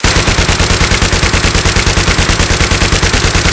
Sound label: M60 fire; Weapons; DCS